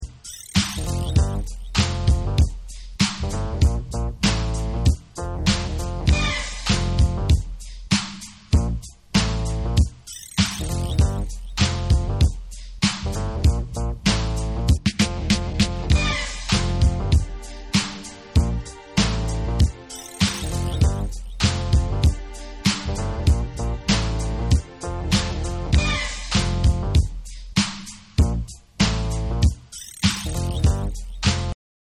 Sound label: Instrumental